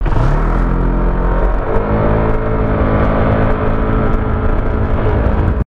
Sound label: pcx160 sound